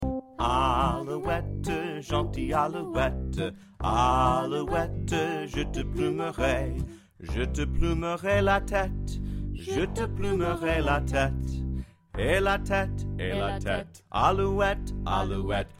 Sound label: vocal; Alouette